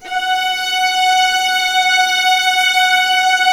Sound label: F#3, Ultimate String Collection, VLAS EXP; LEG